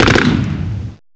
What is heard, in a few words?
MACHINE GUN; MACHINES